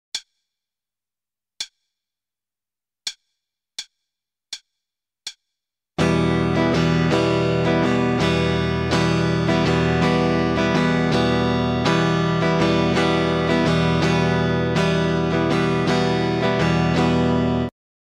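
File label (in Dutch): Ritme, gitaarstarter; Meespeel CD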